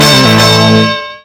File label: Car Horn; Hyperdrive Massacre; Rising Sun